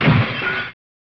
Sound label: Glass Break; Revolution X; SNES